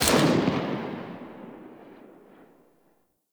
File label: Tommy Gun Tail; Weapons; Warframe; Tenno